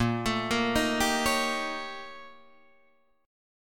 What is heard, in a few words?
Bb Minor Major 13th Bb, Guitar, DADGAD; BbmM13 arpeggiated